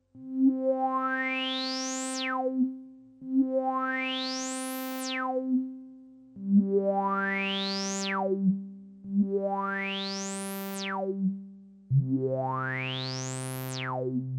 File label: filtersweep original